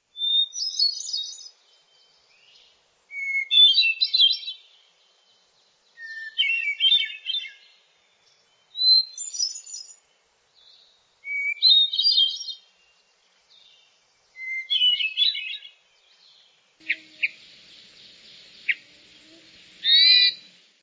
Hermit Thrush

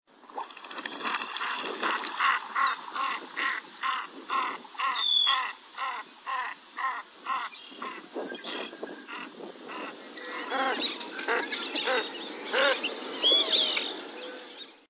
Canvasback

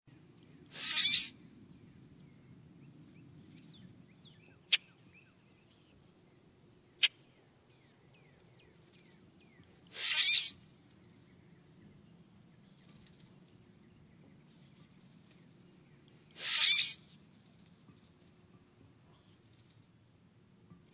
Common Grackle

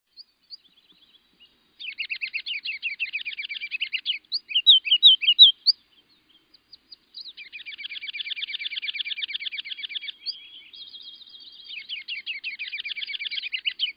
Ruby crowned Kinglet

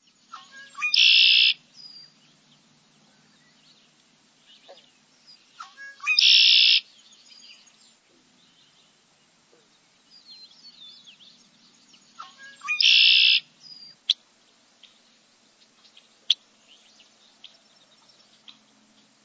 Red winged Blackbird

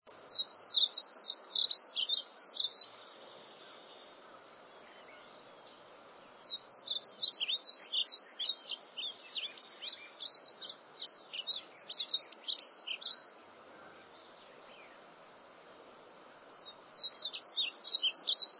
Painted Bunting

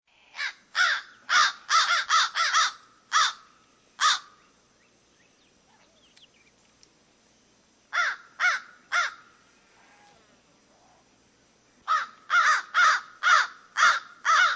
American Crow

